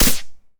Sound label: Syringe Hydro Spanner Inject; SFX Kit; Foley - Misc